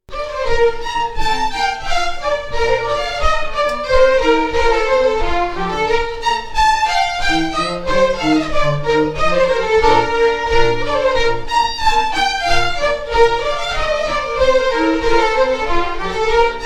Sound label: Quick Step; The Jink & Diddle School of Scottish Fiddling